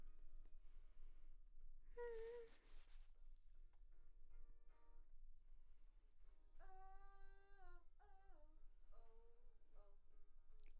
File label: Vox start new